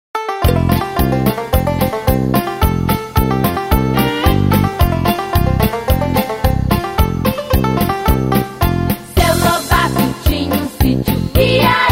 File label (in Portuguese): musica fundo; animais fazenda